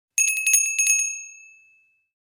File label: Small handbell ring short version